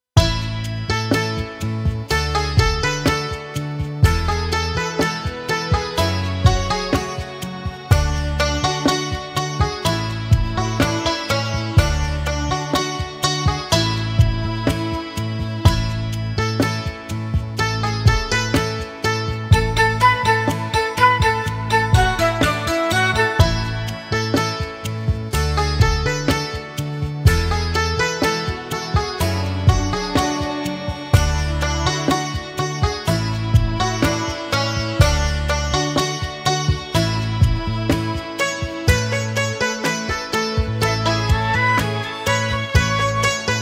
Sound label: Instrumental; bhajan; karaoke